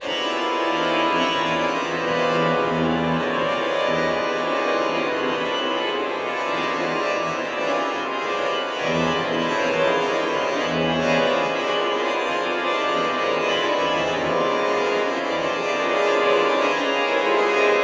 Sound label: Strings; Xenakis